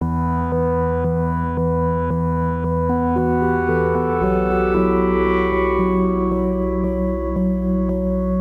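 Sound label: Pensive Keys, B minor 114 bpm; MIDI Clips; Tonal; Motifs; Ableton; Mood Reel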